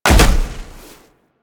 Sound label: Land Heavy Snow, Footsteps; Warframe; Tenno